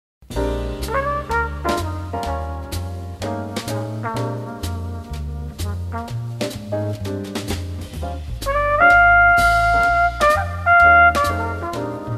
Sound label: Blues in Key of B flat concert exercice